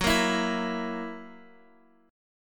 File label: F sharp 7th, Guitar, Open D